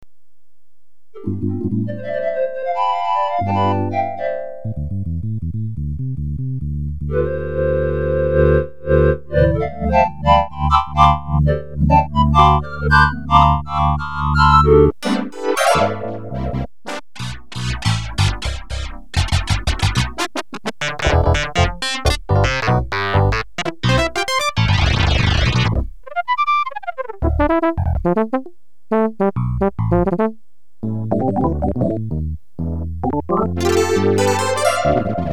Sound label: keyboard